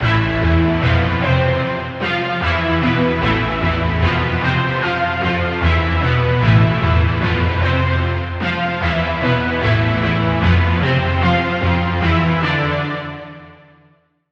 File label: Lead; Concrete Crusher; Routed Sound; Vital Expansion - Street Vanquisher Vol.1